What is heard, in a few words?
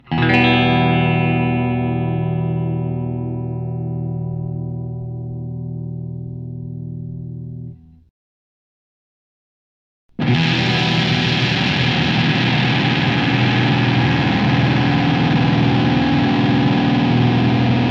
flender w- dearmond2k pups; ronsound